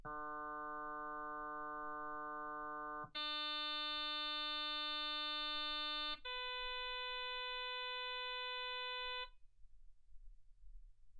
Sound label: harm sinus 48kHz